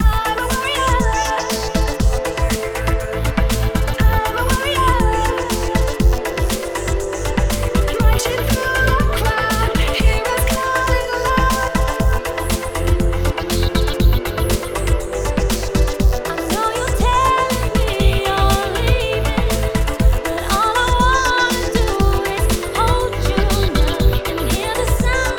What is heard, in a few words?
truck